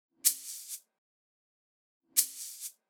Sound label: Single Shaker